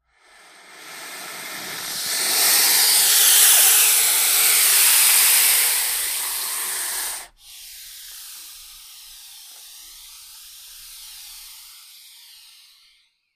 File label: Python, Burmese Hiss. Long Hiss With Inhale. Close Perspective.